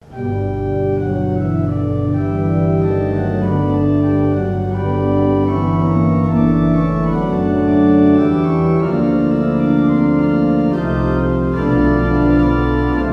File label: Wordsworth, pipe organ